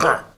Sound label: pig snare; Angry Birds